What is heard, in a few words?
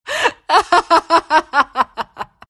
luna laugh